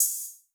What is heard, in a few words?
hihat; osu